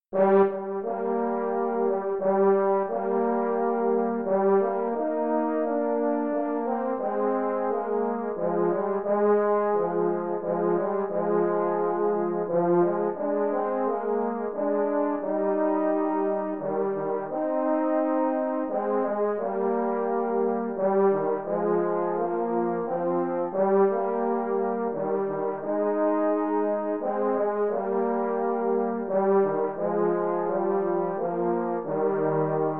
Easy Classic Duets Lullaby (qn=88), French Horn

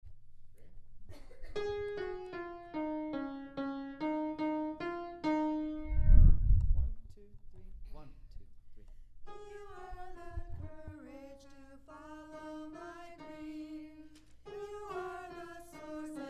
Alto

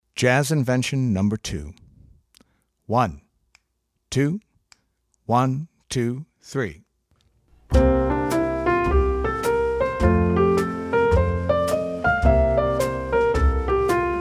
Jazz Invention No. 2

